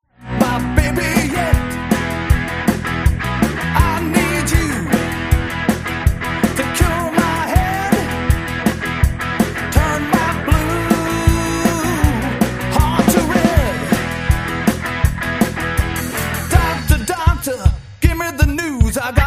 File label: play along drums classic rock